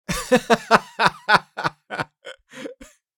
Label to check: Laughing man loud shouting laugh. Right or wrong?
Right